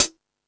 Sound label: Latin Layers, Drum Machines E-MU Pro-Cussion